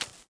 dirt, footsteps

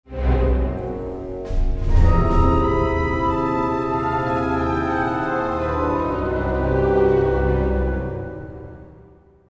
intro, music flourish

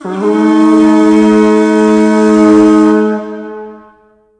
wookie alarm; Kash; Star Wars Clone Wars; XBOX

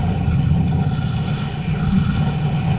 Ambient; Sotha

